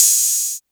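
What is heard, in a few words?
Open Hat; Drums; HiHats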